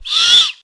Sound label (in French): grand duc